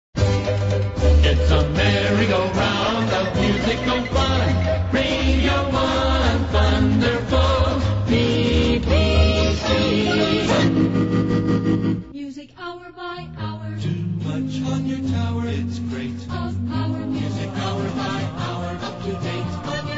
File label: 1960s jingles